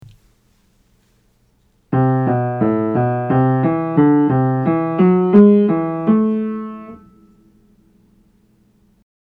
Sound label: Minor Scales; Aural Training exercise